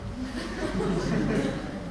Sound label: Laugh